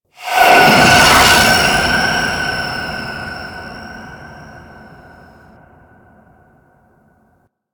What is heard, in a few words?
Void Tower Capture Successful; Warframe